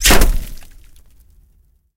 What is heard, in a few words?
bullet hit outside; War Thunder